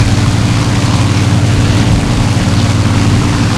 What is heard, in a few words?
bomber rear, engines; War Thunder